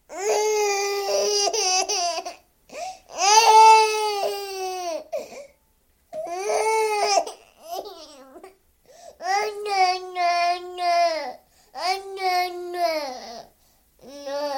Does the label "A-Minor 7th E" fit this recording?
No